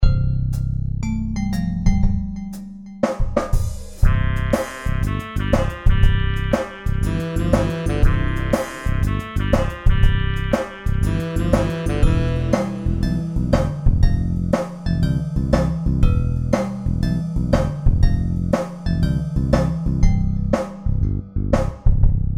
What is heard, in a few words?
catchy swing